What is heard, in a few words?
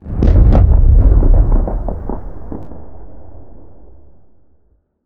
rocket explosion very far